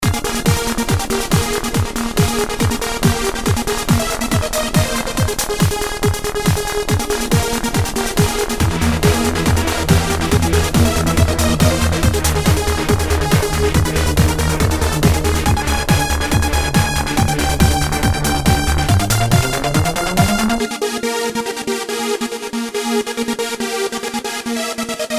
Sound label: Another Beat